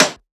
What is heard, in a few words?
Perc, Percussion; FLPRO